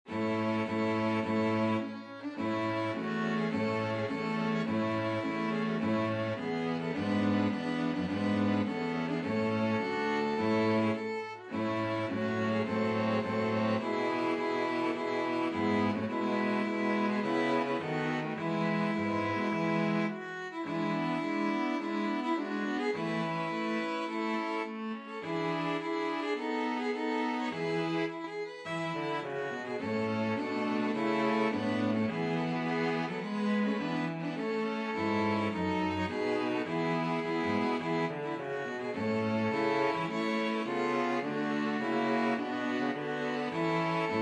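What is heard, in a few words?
belgium national, string quartet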